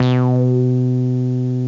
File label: Rezzy 303 C2; Bass Hits